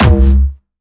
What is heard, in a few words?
Jumpstyle Kick C#2, vengeance essential bassdrums; Jumpstyle Multis; vengeance essential club sounds-3; VEC3 Bassdrums